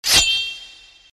Shield; Mega Boulder Rampage

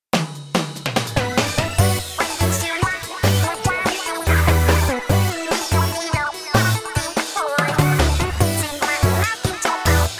Defeat; Team Frye, Phase 1

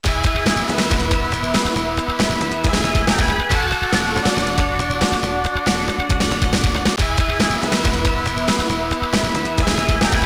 Game Start; World Cup Volleyball 95

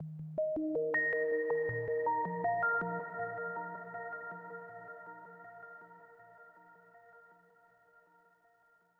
Overlay Tone

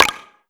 Picked Up Power Clam; Multiplayer SFX; Clam Blitz

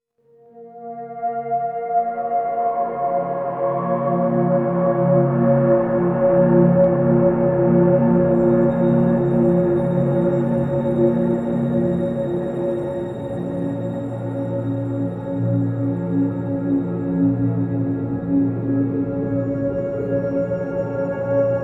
Drone

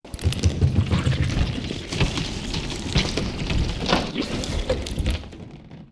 Swarm Inside Wall

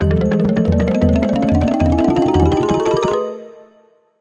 Round Start; Tumblebugs

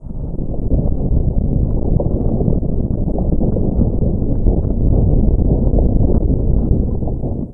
Earth Shaking; Hero Siege; Android

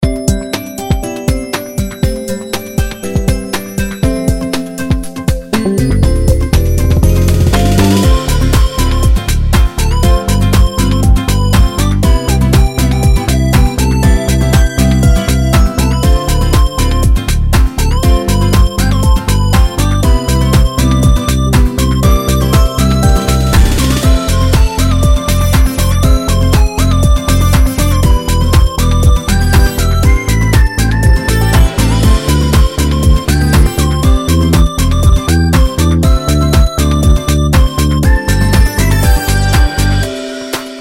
Level Music; Family Restaurant

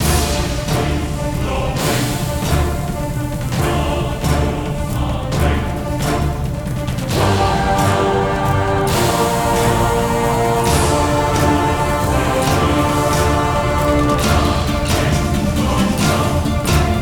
loop music hydra; Hercules

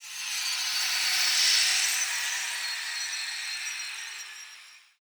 Enemy Flourish; Bolt

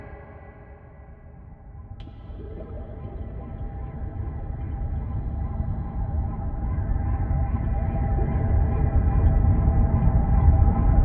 well horror sound; darkness within 2 the dark lineage

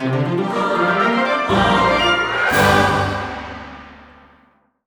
win rare objet

